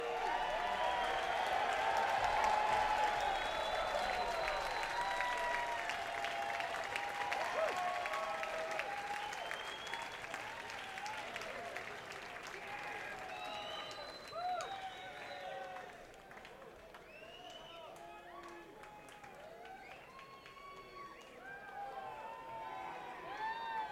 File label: tuning